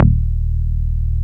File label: PRESSURE D#1, BASSES